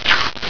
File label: selotape